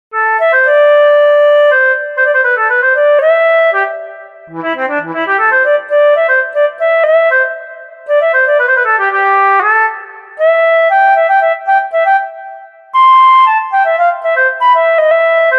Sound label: flute; HALion6 : flute